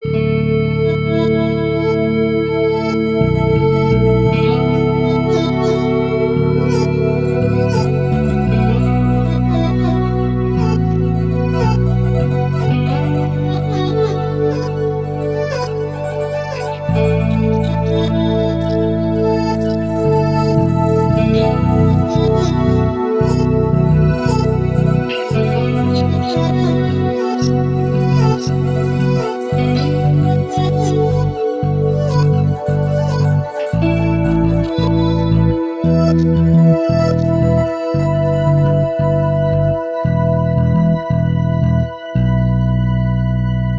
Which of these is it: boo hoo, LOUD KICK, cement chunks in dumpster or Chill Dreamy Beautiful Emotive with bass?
Chill Dreamy Beautiful Emotive with bass